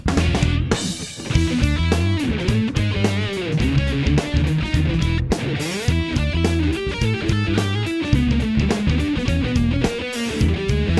Guitar Solo

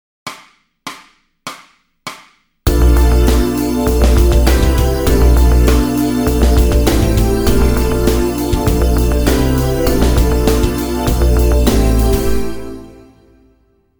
Backing Track

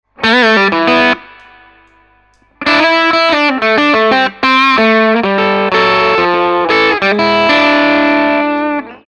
SHUFFLE

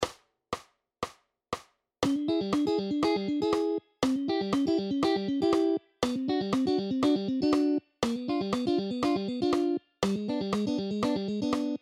Double Stops BPM 120

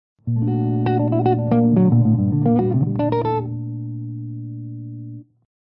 Lydian Jazz Lines; Lydian 5

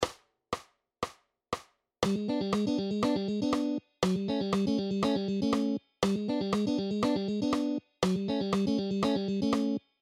Double Stops Style BPM 120

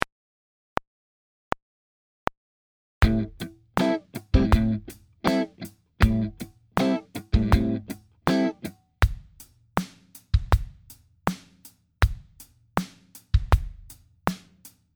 groove

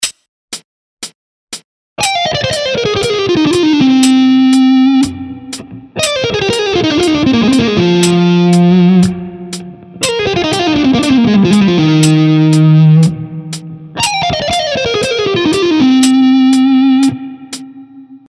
Speed Development Lick

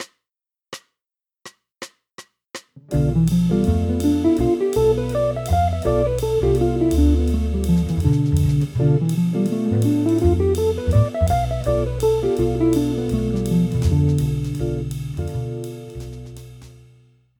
Bm7b5 in posizione di D in drop 2

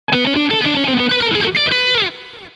Lick

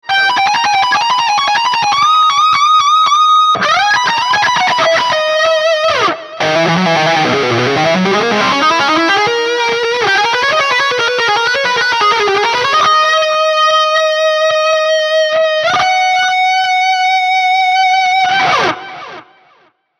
Lick

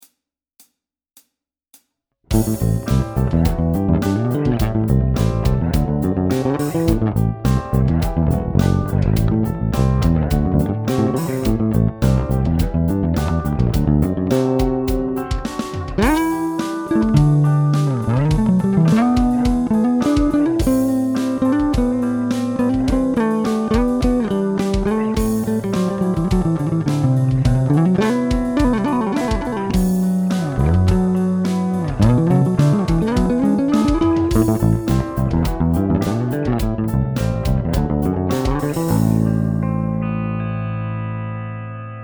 Groove Solo A Dorian, guitar

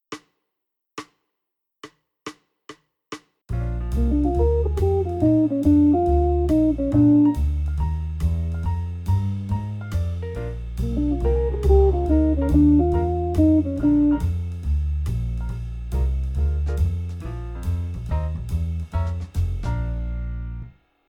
I7 (Medium)

